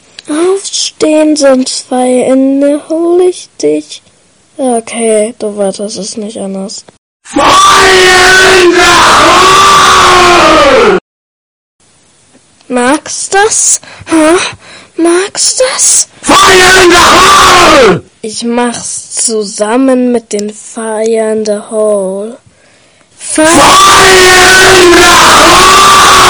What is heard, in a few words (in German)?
wecker